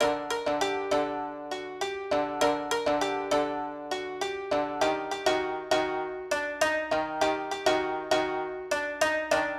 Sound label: Shamisen, Hip Hop Exotica, 100 Dmin; Apple Loops; Big Fish Audio